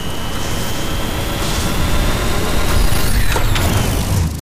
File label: special power; Titanfall 2